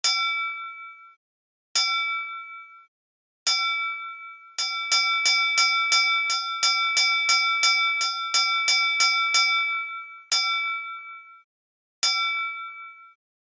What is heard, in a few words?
Aground (vessel under 100m); colregs